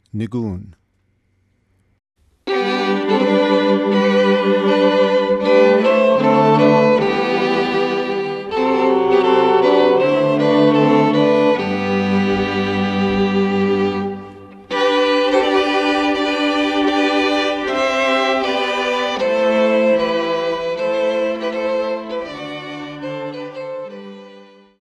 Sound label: nigun, string quartet